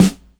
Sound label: sharp acoustic snare f sharp key